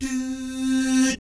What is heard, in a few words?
DOO SFZ, Jazz FX